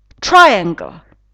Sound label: triangle; ANGLIČTINA – TÉMA: BARVY a TVARY